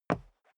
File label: step dress boots concrete single stair up; menschen; schritte